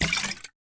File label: pickup; superheroes2; sciencewater